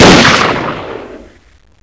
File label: thompson, weapons